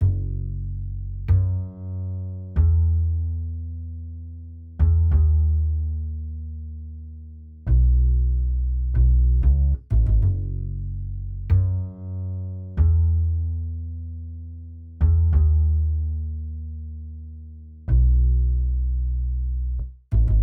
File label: BASS TRACK, Virtual Guitarist Silk; realism of vg silk